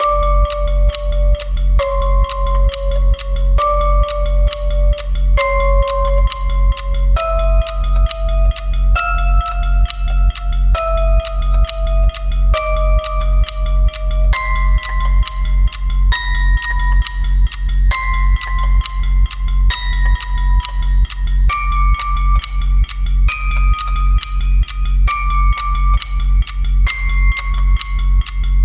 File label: Ill Omened Clock Urgent, horror music box; HauntedBloodlines